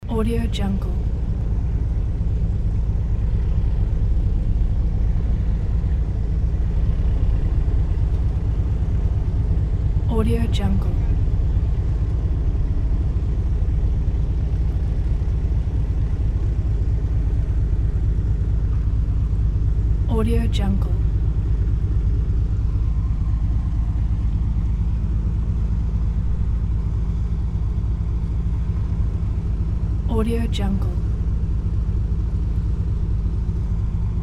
old pilot boat engine sound maneuvering leaving port; ed-soundmaker